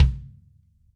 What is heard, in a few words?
Kick, Drums & Percussion One Shots Drums